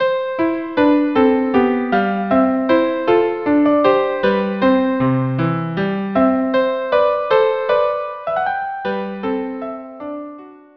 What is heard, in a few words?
viola duo; Haydn; Air; sonata in C